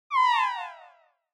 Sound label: Onoma Negative Low Short; オノマトペ ネガティブ; 低 短